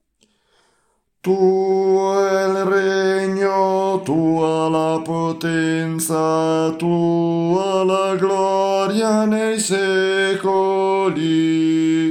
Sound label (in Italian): tenori; Tuo il regno